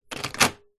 household door between garage and house handle turn; zapsplat